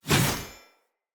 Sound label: Sphere Dual Sword Throw; Weapons; Player; Swords